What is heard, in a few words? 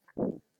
Hand Air Woosh